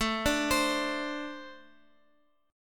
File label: A Minor Double Flat 5th, down, Guitar, Open F; arpeggiated; JGuitar